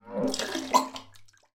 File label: human man vomiting in small bathroom